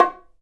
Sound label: Bongo; Digital Sound Factory